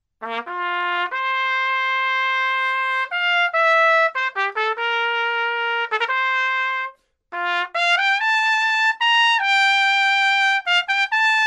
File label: Trumpet